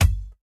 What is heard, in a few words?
Weapon Rubber; Going Under